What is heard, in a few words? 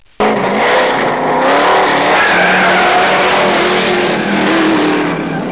burnout, transam firebird formula pontiac